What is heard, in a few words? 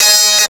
STRAT, GUITARS; FAIRLIGHT CMI IIX